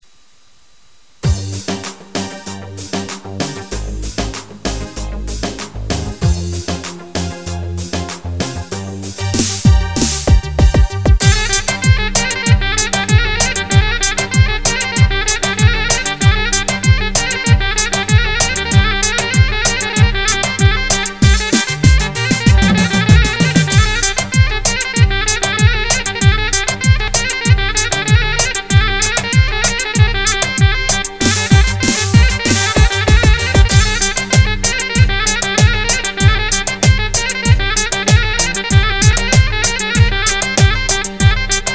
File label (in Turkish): pop halay, gw 8; gw-8 yeni tr set